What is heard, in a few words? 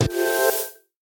ufo